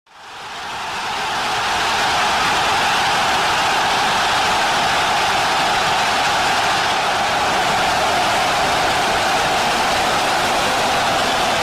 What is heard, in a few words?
crowd pop long